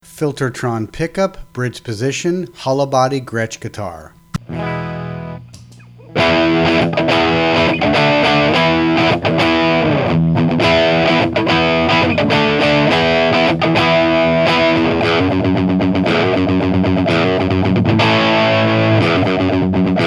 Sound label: Filtertron Bridge